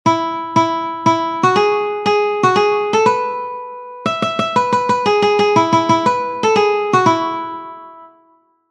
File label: row row row your boat guitar one string